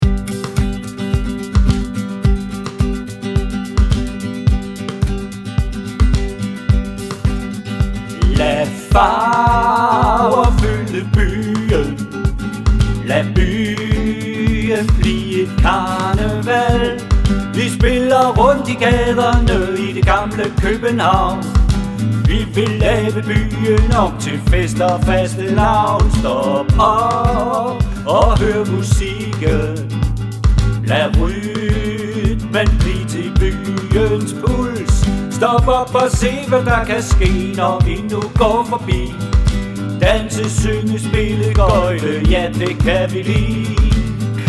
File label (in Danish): karneval sang